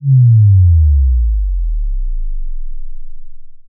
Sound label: Sub Drop